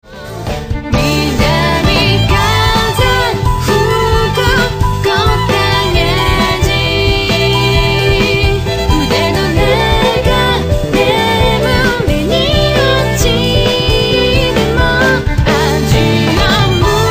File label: Asian Wind